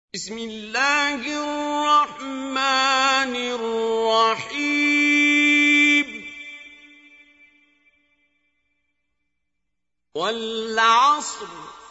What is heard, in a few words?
Recite, qari quran; Surh Asar; Qari Abdul Basit